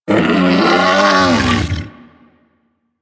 Predasite Vocal Death; Warframe; Hunting Critters

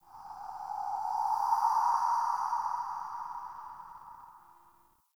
Spirit Gust; Ambience; Dungeons; Under City

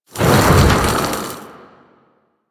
Spit Attack Projectile By; Warframe; The New War; Archon; Nira; Abilities

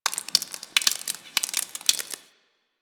Ice Thaw Drip; Ambience; Warframe

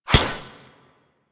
Glass Shatter Damage Hit Effect; DE; Warframe

